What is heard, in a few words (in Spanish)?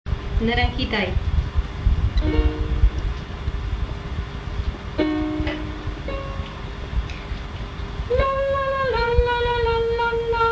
Bajos